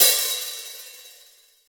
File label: snappy open hi hat sample b key, Royality free open hat one shot tuned to the B note.